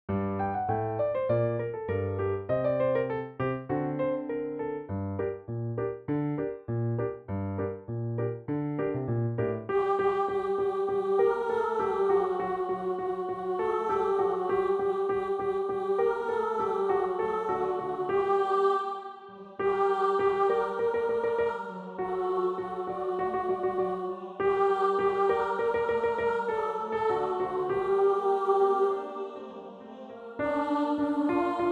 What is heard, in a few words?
Sleigh Bells, alto